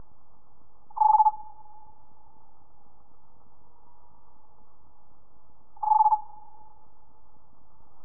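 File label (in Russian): сверчок мягкий близкий нежный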